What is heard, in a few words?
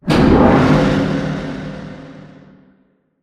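Assembly Conveyor Start Distant; Boomtown; Titanfall 2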